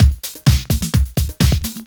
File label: Housebeats 128BPM; Vengeance Samples